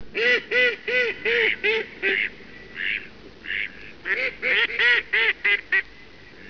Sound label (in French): Canard colvert, oiseaux; faune; seuils fresne